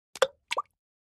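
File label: Splash Small Plop Plastic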